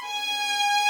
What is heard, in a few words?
violin